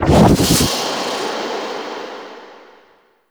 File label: Warp; Gameplay; Warframe; Chimera Prologue